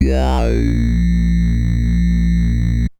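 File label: synth bass, drum and bass, 160 bpm, C; eJay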